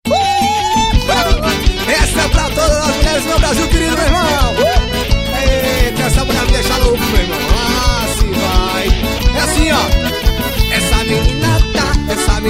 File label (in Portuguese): xote ao vivo